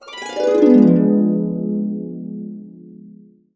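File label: D diminished 7, harps; USB Soundscan vol.62 - Complete Harps; AKAI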